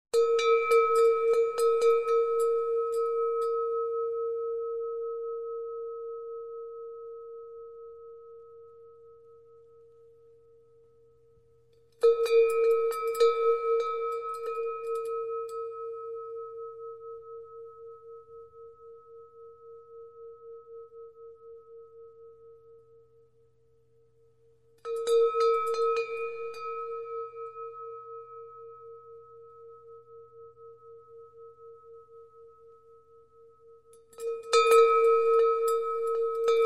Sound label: BELL